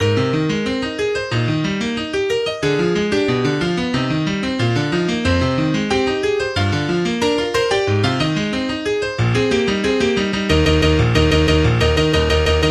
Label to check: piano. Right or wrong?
Right